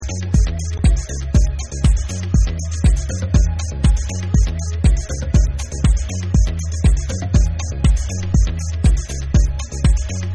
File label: Percussion Dub